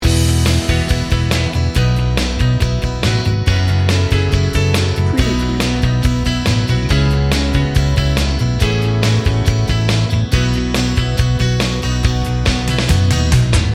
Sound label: optimistic pop rock loop